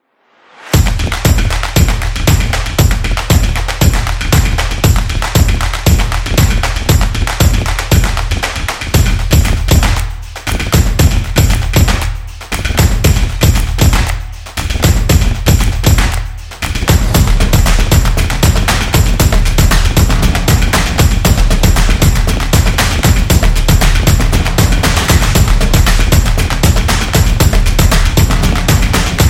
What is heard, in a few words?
Drums Action